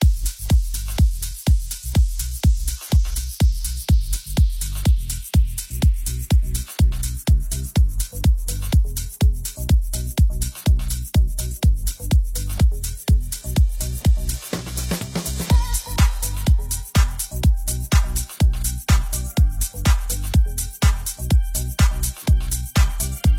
90S Classic House; Frequency Response Audio

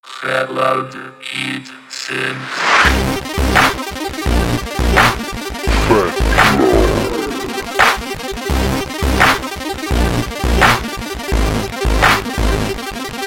Fat Loud Heat Synths

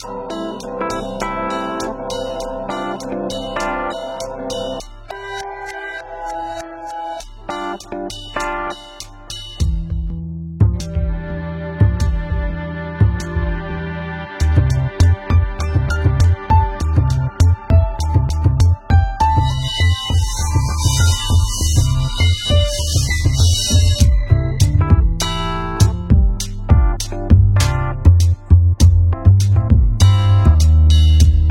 Downtempo

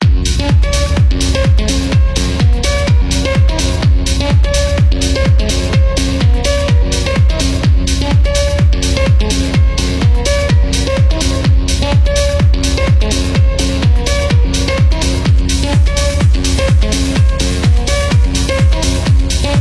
Big Room Techno

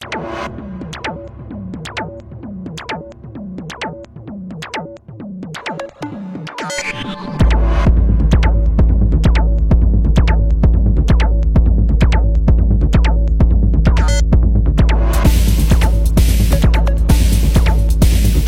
Deep and Dark Techno

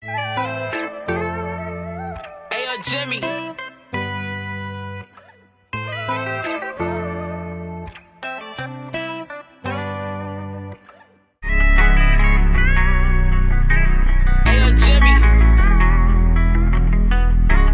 Guitar

